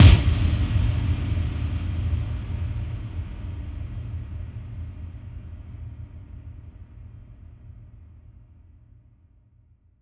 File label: FX Impact